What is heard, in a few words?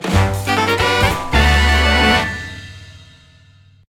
Quiz Fanfare